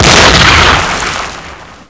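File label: grenade explode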